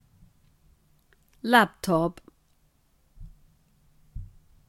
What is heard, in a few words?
laptop